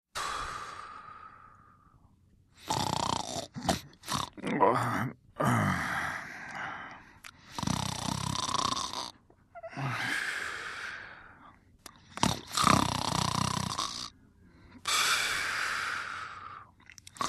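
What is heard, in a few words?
Male Restless Snoring Moaning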